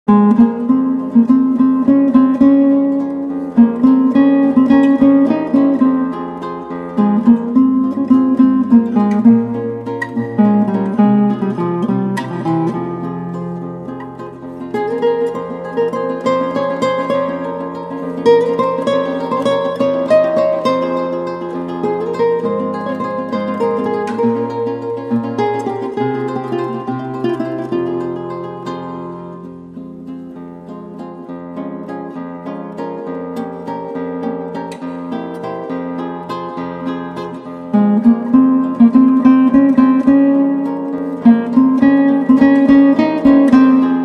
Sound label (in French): Danse Catalane